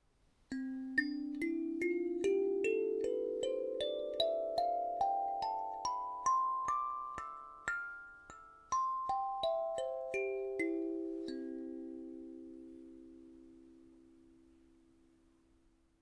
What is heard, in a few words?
treble, kalimba